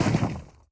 AGS17 R End, Weapons; DCS

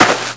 foot grass, footsteps

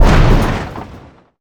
touch, damage; DCS

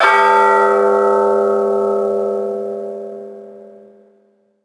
bell; ambient map malinovka

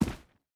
footstep boots stones jump land; Rust

